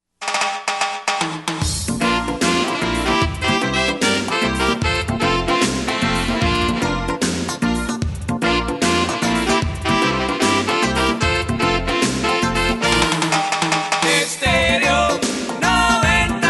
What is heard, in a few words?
FM REGGAE